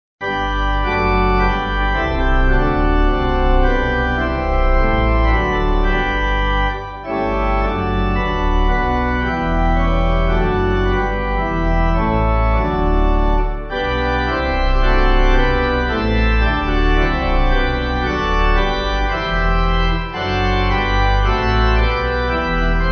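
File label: The Kingdom Of God, Pipe Organ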